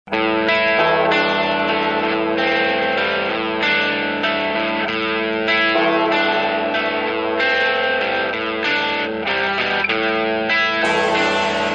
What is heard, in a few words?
ringtone